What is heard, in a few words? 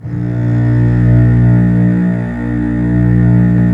STR LUSH S, MIDI Section; String Master Series; Combos 2